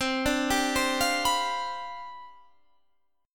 C Major 7th Suspended 2nd Suspended 4th C down Guitar Half Step Down, chord